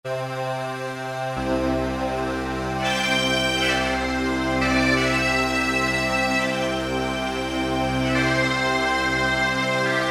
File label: Bagpipes; Roland SR-JV80-05